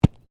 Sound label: Body movement Organic Impact Medium; Body movement foley to simulate falls and deaths; Wrestling And Struggling